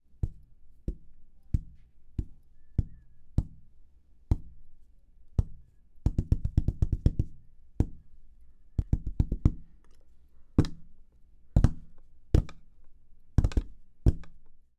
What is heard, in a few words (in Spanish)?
Pasos Ni A